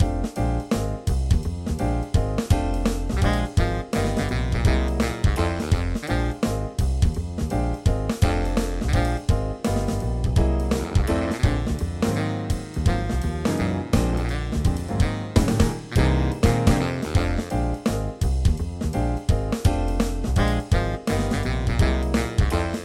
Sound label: Jazz